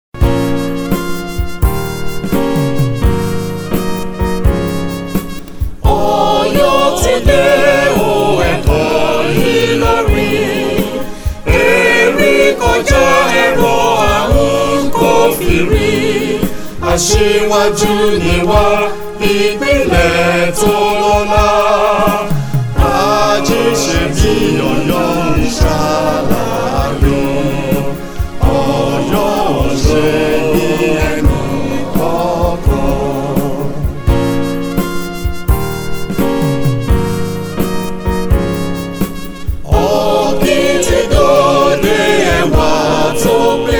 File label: ANTHEM, CHOIR; IPINLE OYO ANTHEM; AKANSE TI LAUTECH